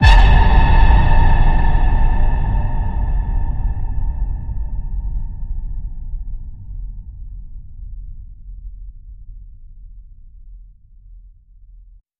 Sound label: Ping, A